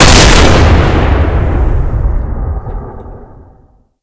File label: sniper